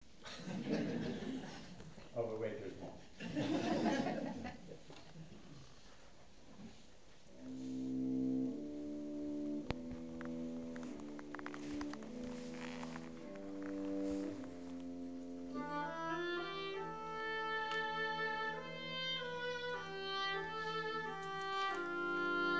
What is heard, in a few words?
Bells; Lutheridge Advanced; EpiphanyBuilders